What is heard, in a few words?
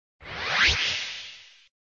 Sound SFX, flipper